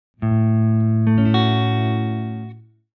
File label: A maj6, chord, guitar; triad substitution